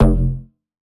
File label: Bass F, Bass Synth